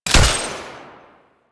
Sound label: Rifle Projectile Launch; Sundial